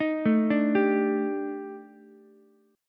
D sharp Major 7th Flat 5th, down, Ukulele; arpeggiated; JGuitar

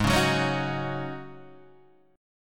G sharp 7th Suspended 4th G sharp, Guitar DADGAD

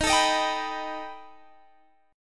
B Augmented 7th D sharp

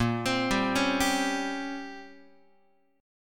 Bb Minor 6th Add 9th Bb down Guitar; arpeggiated; JGuitar

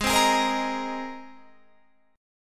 G sharp Major Add 9th, Guitar Standard; G#add9 strummed; JGuitar

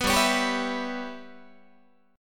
G 6th Flat 5th G, Guitar; G6b5 strummed; 14,15,16,17,15,15

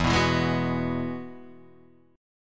F sharp 7th Suspended 2nd Suspended 4th, E

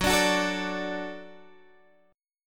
Gb Minor Sharp 5th Gb

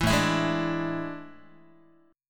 A Major 7th Suspended 4th, Guitar; AM7sus4; strummed; 7,5,7,6,6,x

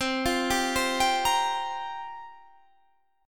C 7th Suspended 4th, Guitar, Standard; C7sus4 arpeggiated

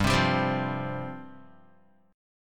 G 7th Suspended 4th G down Guitar; Listen to G7sus4 strummed; G7sus4 Chord

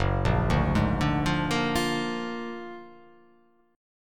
F sharp Augmented Major 9th F sharp

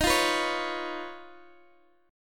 Eb 7th Suspended 2nd Sharp 5th, Guitar Open G; x,x,13,10,12,11; down

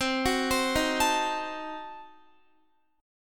C Diminished 7th, Guitar, chord; Cdim7 arpeggiated

